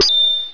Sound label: New Level; Deco 1.0